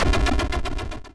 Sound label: Retro Game Weapons Sound Effects, dead